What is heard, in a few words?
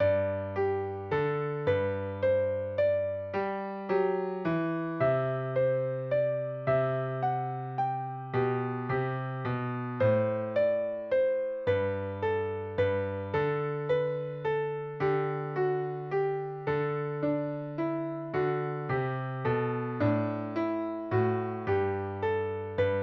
minuet in g, piano